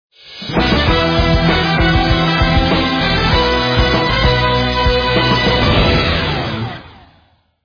sports jingle stinger ident

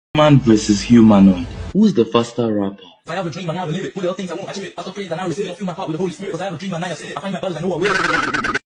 rap battle

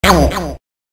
noise

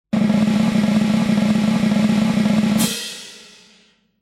drum roll

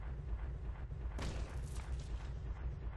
beast rock collect

